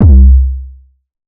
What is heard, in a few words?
808 one shot C; Slate Digital BASSHOLE Sample Pack